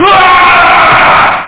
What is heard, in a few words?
Falling; Meeting Pearls Vol. IV; GTI - Schatztruhe; Action; Knockout